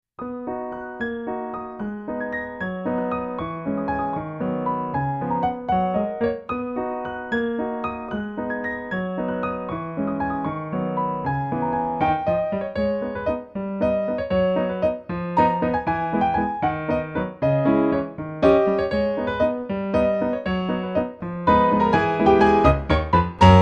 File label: pianoa